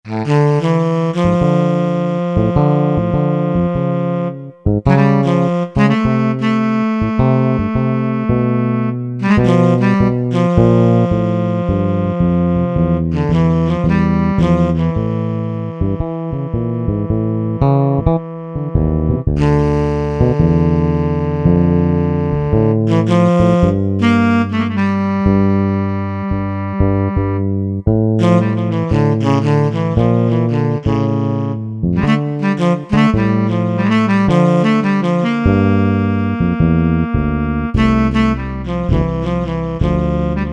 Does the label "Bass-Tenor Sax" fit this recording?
Yes